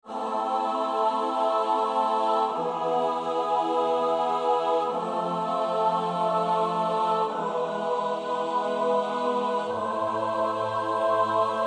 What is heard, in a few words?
Adoramus te (S-A-T-B); Palestrina (Giovanni Pierluigi da)